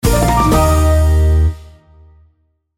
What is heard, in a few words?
victory